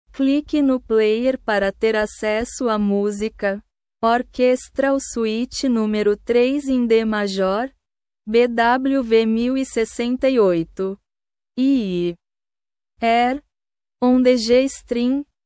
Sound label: air on the g string arr for piano; orchestral suite no 3 in d major bwv 1068 iii